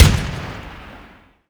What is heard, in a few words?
imperial carbine 1shot